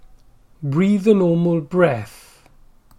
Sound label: breathe a normal breath; briːð/ /breθ